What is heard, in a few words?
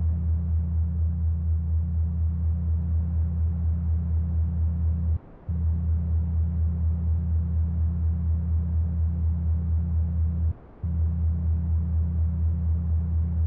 soundscape